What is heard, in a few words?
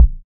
bass drum sample A key, steel kick drum sample tuned to the A note; kick drum samples